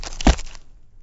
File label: Mountain Rock Light Med